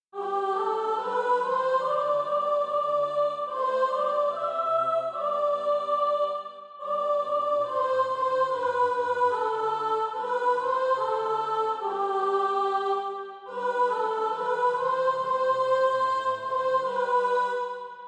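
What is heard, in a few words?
soprano, Practise Music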